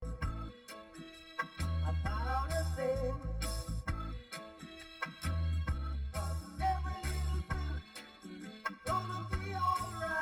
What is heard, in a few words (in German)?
Surfermusik